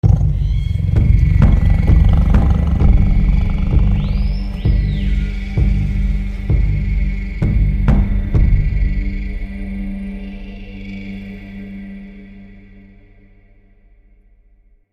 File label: Defeat